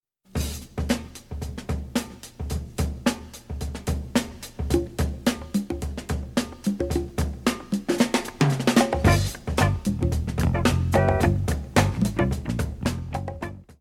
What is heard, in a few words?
Beat